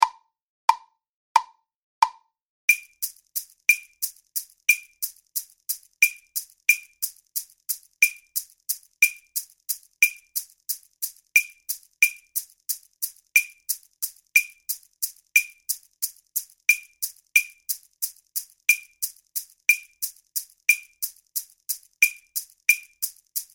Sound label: Son Clave 3-2 90 bpm with 1/8 Note Shaker